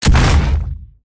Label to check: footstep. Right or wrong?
Right